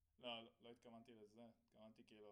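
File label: Snare Top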